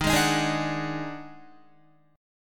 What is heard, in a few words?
Eb Minor Major 7th Double Flat 5th Eb down Guitar; EbmM7bb5 chord; JGuitar